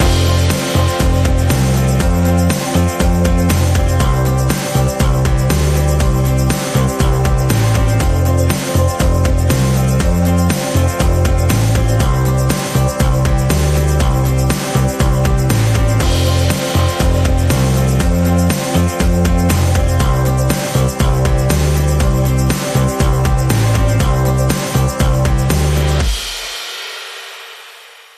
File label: Happy Twanging, Rhythm